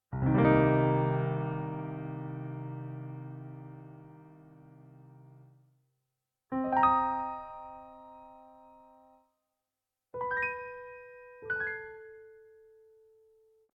Piano broken chords, XV-88

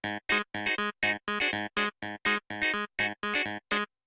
Clavinet, XV-88

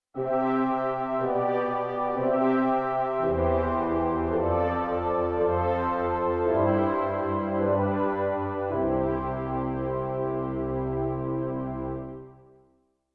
Tudor Horn; XV-88; prog

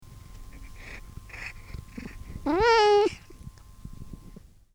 Purr Miaow

